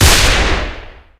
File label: spy revolver, weapons; enforcer; tf